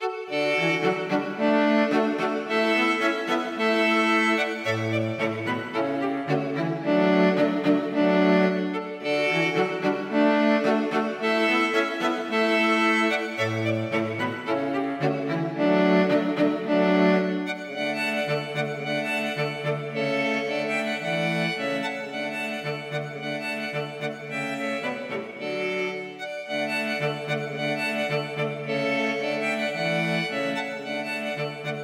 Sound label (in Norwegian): Marchs og Engels for strykekvartett; Collett